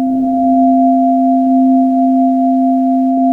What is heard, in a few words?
ringing C2